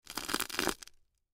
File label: Cereal Crunch, Cereal Crunch Grind With Spoon Food; Household; Blastwave